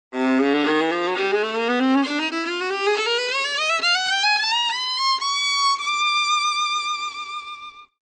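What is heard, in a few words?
viola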